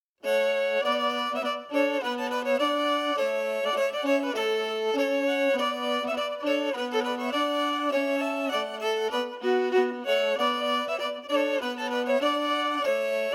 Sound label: harmony emphasized; Greenwoodside